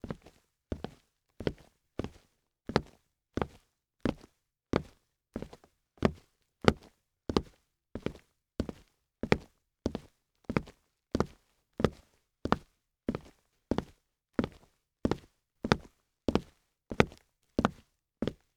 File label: Combat Boots Walk; Footsteps; Haunted Bloodlines; SFX Producer